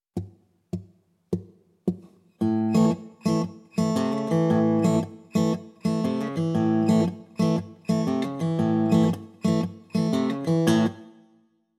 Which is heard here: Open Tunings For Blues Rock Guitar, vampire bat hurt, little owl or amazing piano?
Open Tunings For Blues Rock Guitar